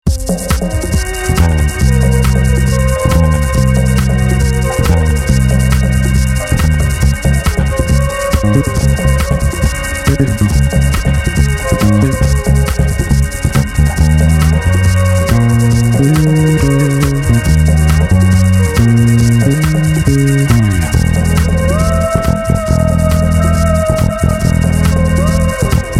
Super Locrian groove, bass; a bassists guide to modes

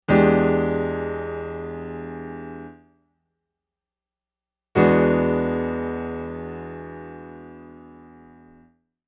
Ninth Chords

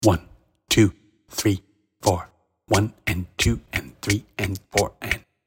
Straight 8ths Counting

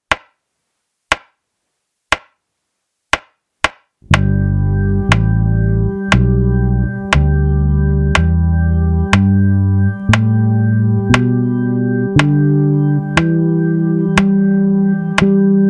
Major pentatonic, bass; a bassists guide to modes

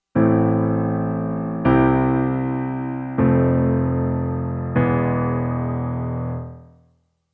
chord progression

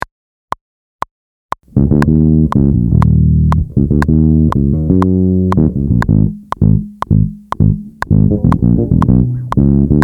Behind the beat with click

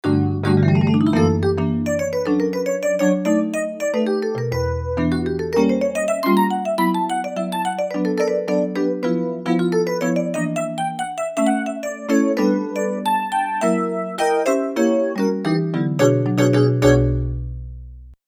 FM Epno plus marimba Spainish

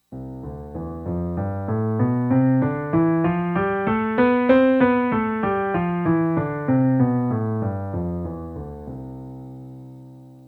scales with crescendo decrescendo

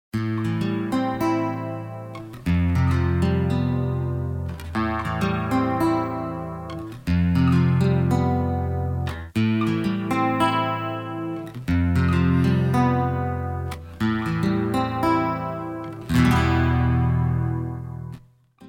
rhythm DI

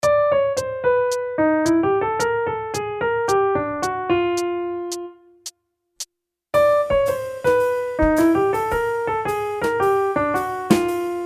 various feels

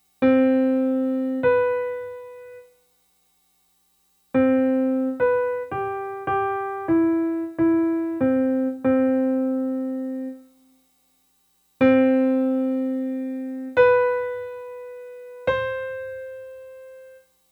major 7th

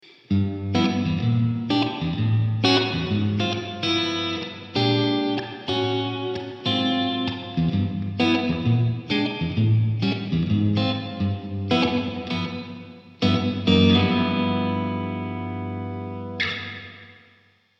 PLATE REVERB

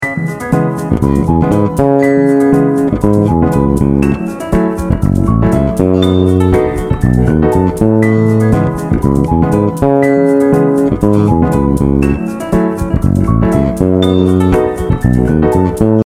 Emajor, C#mi7 – F#mi7 – B7; bass; substituting the ii chord (F#mi7) for the IV chord (Ama7); a bassist's guide to chord substitutions

